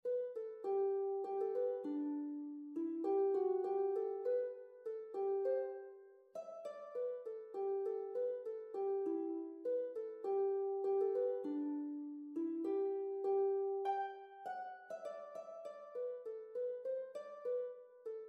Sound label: Treble Lead Sheet for Harp in G Major